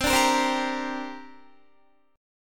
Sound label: C 7th Suspended 2nd, Guitar; C7sus2 strummed